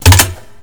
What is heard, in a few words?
lockpick fail; crystall-punk-14